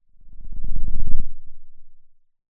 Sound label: Sound of a lion’s roar